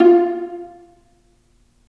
Vas Pizz; STR Vlas Pizz

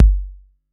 BD 808

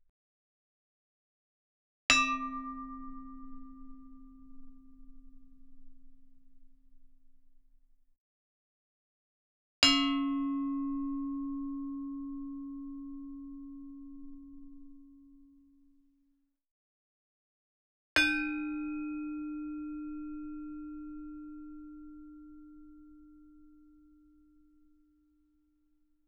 Gamelan; Propeller Island - Complete Gamelan

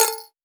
Perc Bell Pen; Kagi; M8; percussion and foley

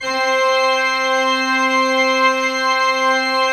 C4, cathedral organ; Propeller Island

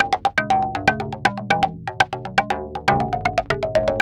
TALK, TALKDRUMSYNT, Yamaha VP-1; Music Store

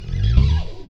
BS CHPSTK, Chapman Stick

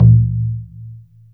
MALAY DRUM; Spectrasonics - Heart of Asia CD2

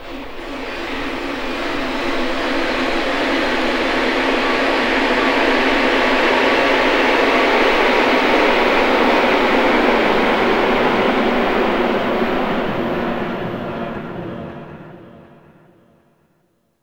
STR, String FX; Roland - LA Composer vol. 2

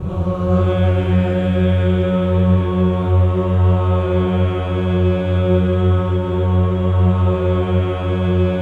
VOWEL; Spectrasonics; Symphony of Voices; OCT VOWEL

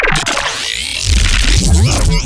glitch stab; Virtual Riot; Glitch Stabs

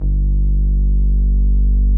PLINK; Greytsounds - Scott Peers Sound Engineering

